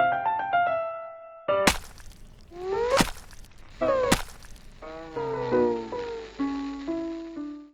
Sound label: stab